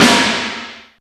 big snare a key, Royality free snare drum sample tuned to the A note. Loudest frequency: 2323Hz